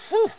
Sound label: Whew